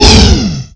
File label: blackhole in; Angry Birds Space